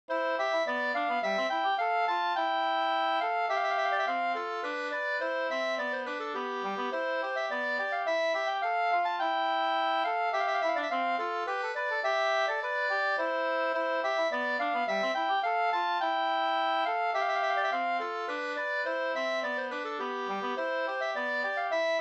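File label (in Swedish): slangpolska, two-part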